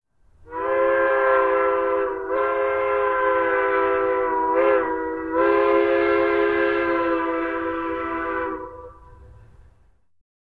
Steam Train Whistle

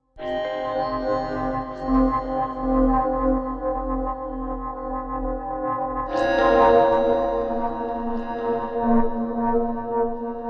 Music Void Distorted Mu, musical

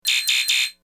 Computer Warning Bee, beeps & tones

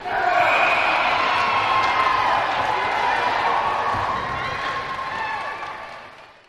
Crowd Lets Do It React, big arena

